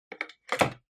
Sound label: Handheld Transceiver Charging Stand Put Down